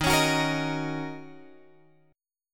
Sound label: D sharp Minor 7th, Guitar, Open F; strummed